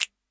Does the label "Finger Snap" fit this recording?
Yes